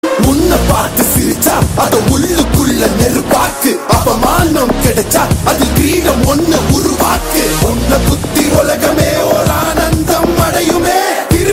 Lyrical

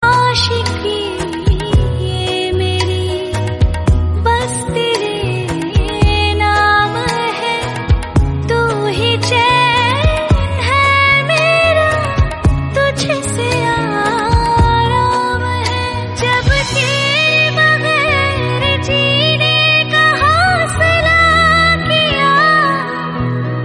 Female Version

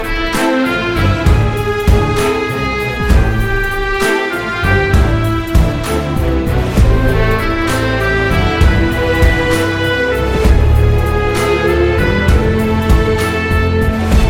BGM Ringtone

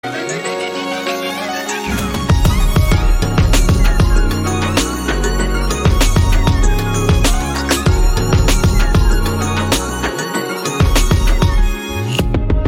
BGM Ringtone

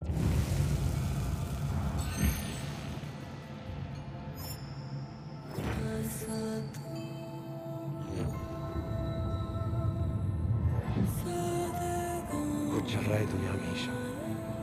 BGM Theme tone; Brahmastra

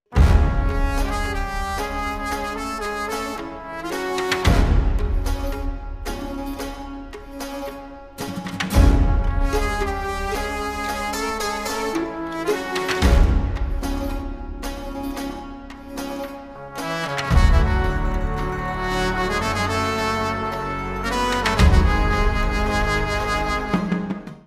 ONLY INSTRUMENTAL; The Night Manager

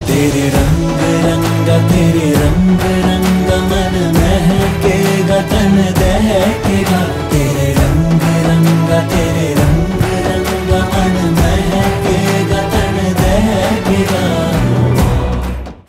Ringtone Male Version

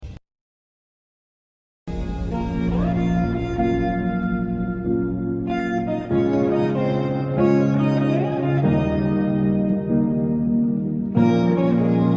Sad Bgm

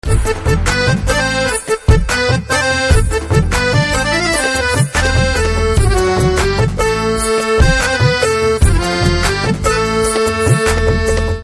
Instrumental

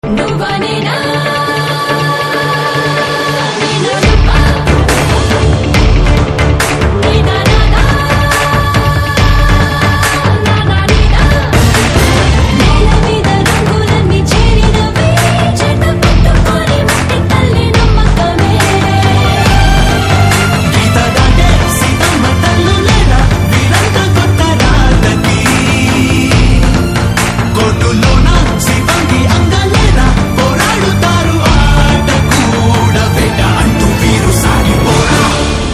Anthem Ringtone

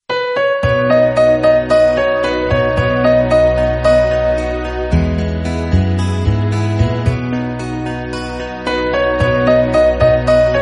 instrumental Ringtones

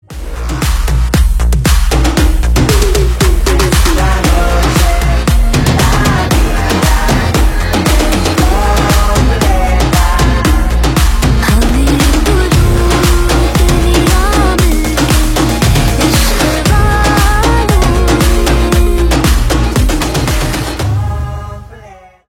Instrumental; Pathaan

